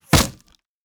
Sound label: wood bullet impact; Rust